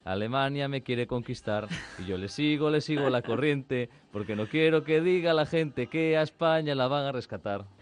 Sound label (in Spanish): cantando